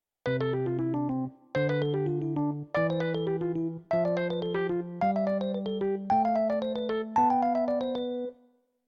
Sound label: scales, piano